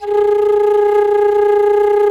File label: FLUTTER G#4, WOODWINDS; Greytsounds VOL-1